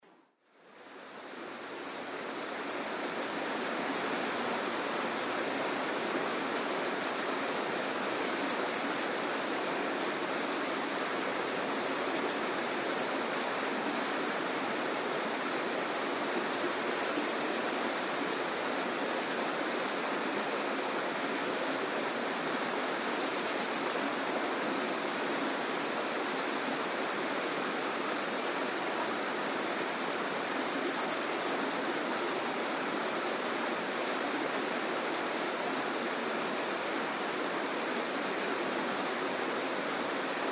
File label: grey wagtail motacilla cinerea